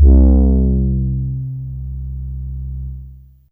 Brass C2, Fairlight CMI Analog

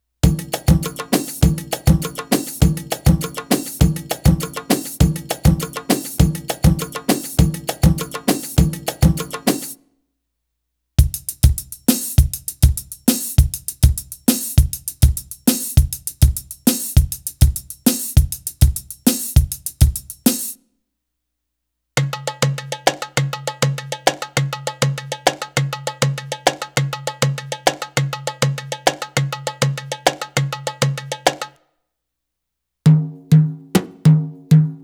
Arabic Rhythms

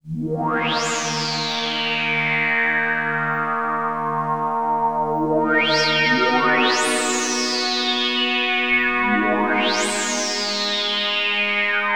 pad, Drum & Bass; Best Service ProSamples vol.03 - Drum & Bass 1; AKAI

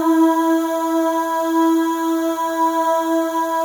FEM AHS; Spectrasonics; FEM.1 AHS

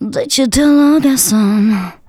VOCALS SUNG; Techno Trance Essentials

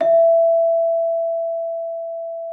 Celesta E5; Xsample VOL-8

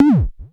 WOO, Dual Percussion Synthesiser; Klone

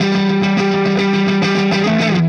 Clinical Distorted Funk Guitar

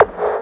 hard bass drumz

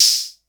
HH Open, Vermona DRM1-MK2 Hi Hat

